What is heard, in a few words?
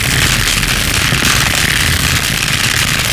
shockwave projectile loop, mechanical units, substation; Half Life Alyx